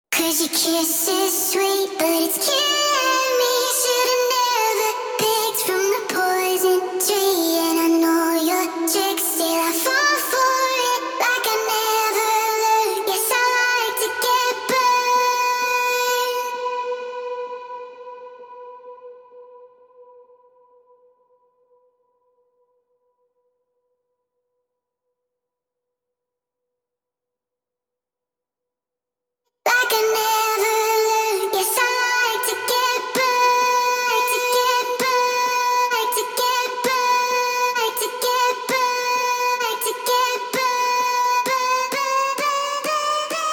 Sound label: Acapella Vocal